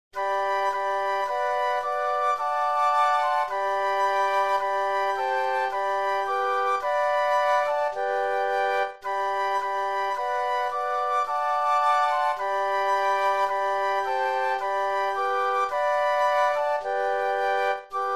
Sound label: Flute Quartet; christmas